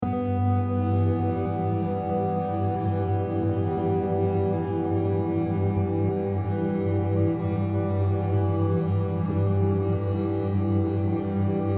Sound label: Slow Waves